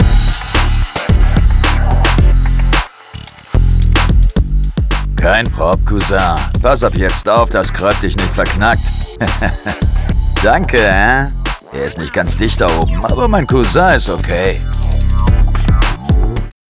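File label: Outro Victory German; arthur and the revenge of maltazard; wii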